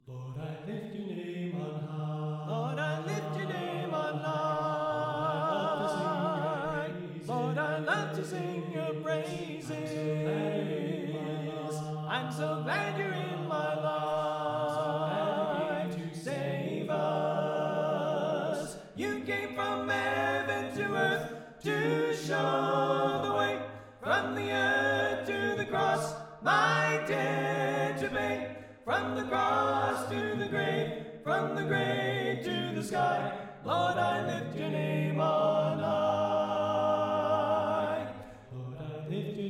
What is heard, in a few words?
Alto Left